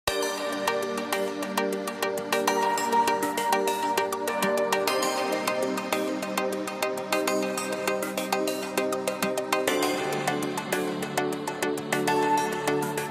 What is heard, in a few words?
tropical guitar sounds